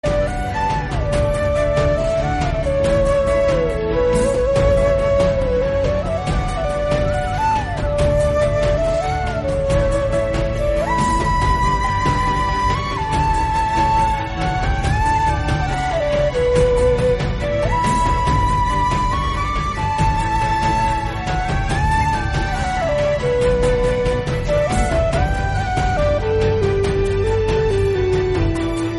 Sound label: happy birthday bgm instrumental; remo